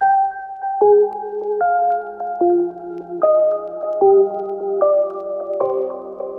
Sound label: Simple Melody Loop - 150 BPM C Min Piano; Cymatics; Oracle Sample Pack